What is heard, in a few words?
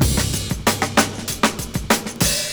FILLS, 70_s Breakbeats